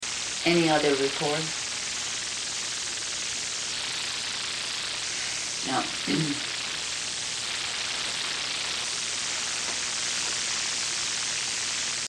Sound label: Silence; Silence Meditation; Tuesday Class